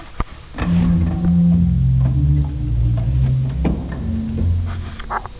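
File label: Pedal, organ; Vitus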